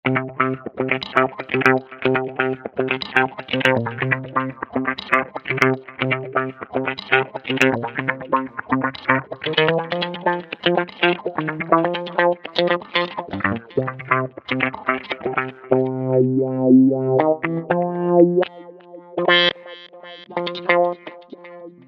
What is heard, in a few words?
Roland V-Synth; Factory Patches